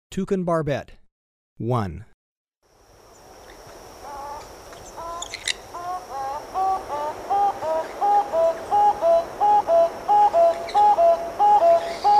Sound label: Toucan Barbet, Birds of Ecuador; S. America